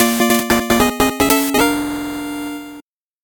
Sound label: 8bit jingle normal